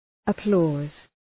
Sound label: applause